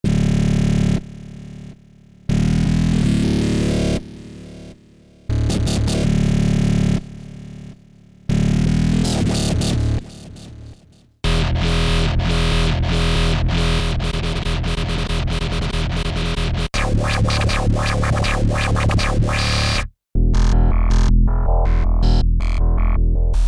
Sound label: motifxs Evolving Wobble Bass